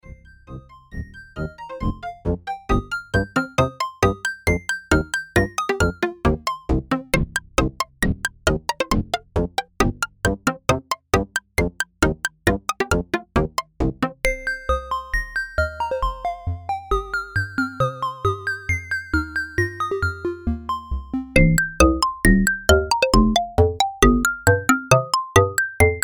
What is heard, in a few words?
Tube Plucker; Tube Hits